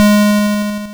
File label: GB Synth G#; SYNTHS; CHIPSHOP DELUXE; CHIPSHOP HITS